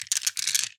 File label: Marbles Rattle